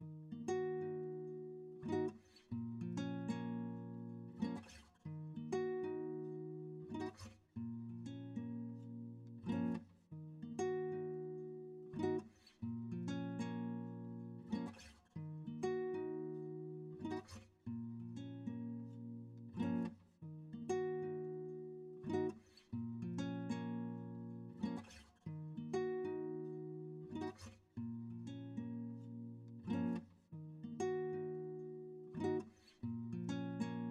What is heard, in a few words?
95 BPM D# Min Guitar Lead, Hip Hop; Melody Loops; Cymatics; Hip Hop Sample Pack; Fina